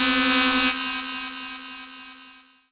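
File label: Rave Synth, Dance Mega Jungle Rave; Best Service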